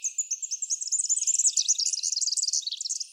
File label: more exploratory male, canções destas aves; personalidade influencia a complexidade das canções destas aves